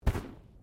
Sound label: Bodymovement Fall Light